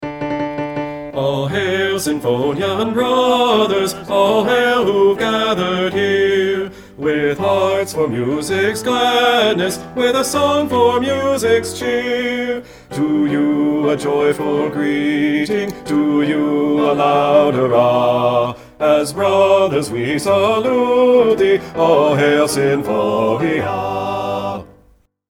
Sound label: Bass loud